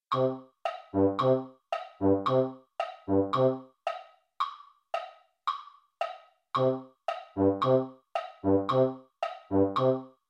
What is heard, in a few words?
baritone; holiday brass